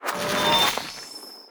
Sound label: laser